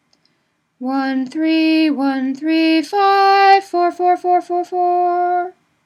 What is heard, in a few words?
weekday minor opening, nusach; SCALE AND MOTIFS FOR WEEKDAY MINOR